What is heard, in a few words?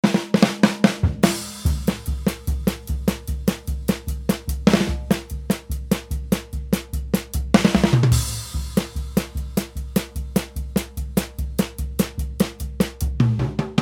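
funny driving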